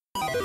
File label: spin; Mini Game